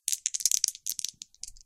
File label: dice rolling; Arcane Quest; android game